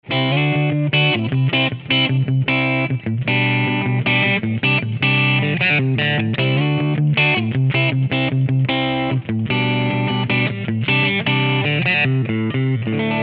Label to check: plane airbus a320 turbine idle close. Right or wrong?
Wrong